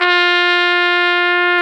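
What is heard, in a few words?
trumpet, harmon dry; Solo Brass; Trumpet 5-7; Tp 5 RCA Jaz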